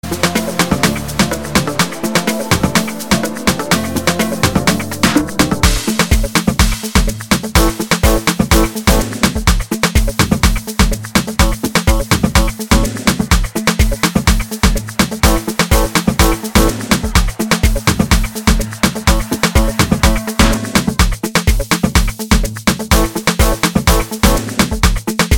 south african ringtone

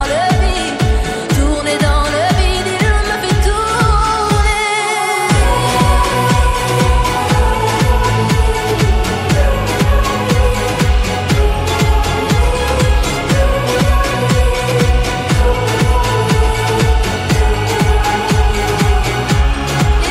french song ringtone

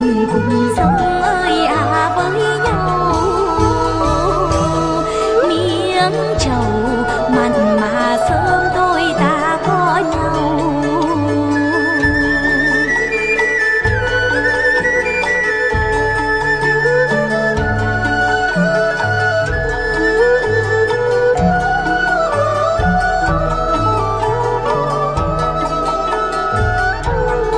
vietnamese folk ringtone

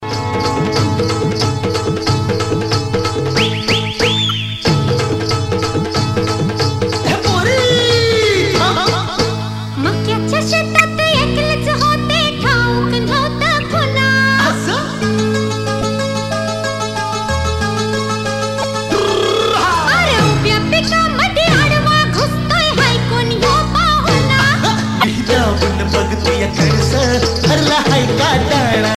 marathi ringtone

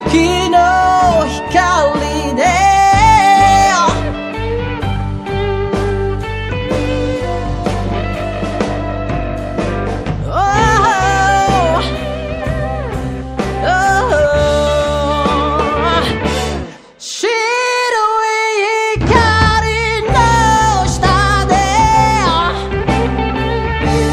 japanese ringtone

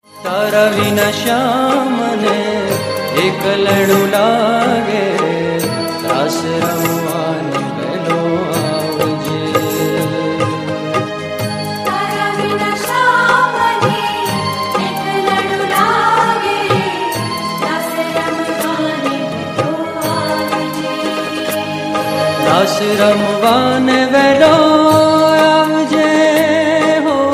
garbo gujarati; ringtone

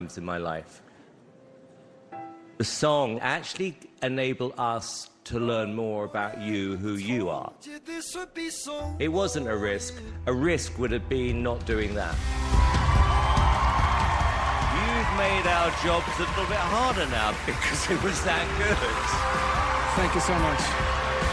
swedish ringtone

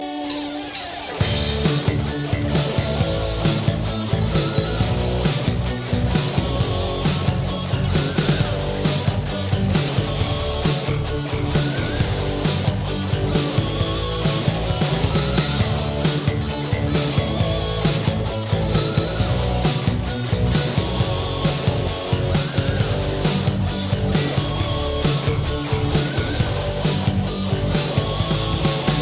canon classical rock ringtone

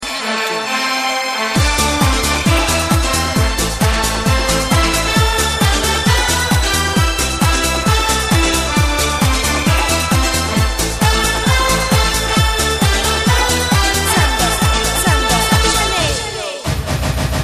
samba ringtone

